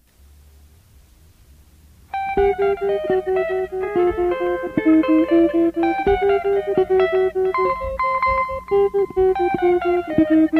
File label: Music Box; Super Mario Bros